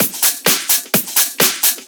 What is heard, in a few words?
Discohouse Loop 128 BPM